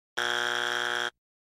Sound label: wrong answer